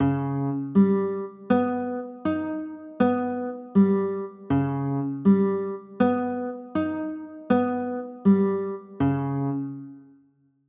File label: d sharp 7 sharp 5